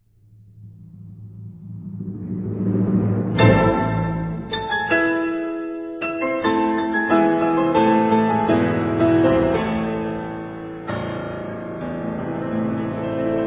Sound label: Piano Concerto in A Minor; Jedvard Grig